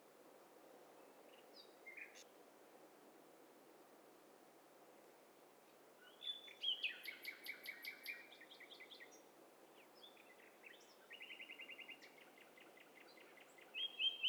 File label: STEREO BIRD COCKTAIL PARTY